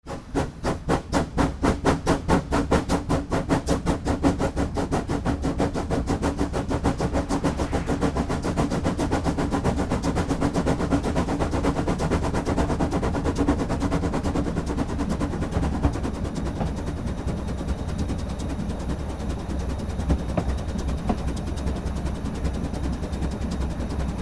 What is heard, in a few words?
old train cabin inside departing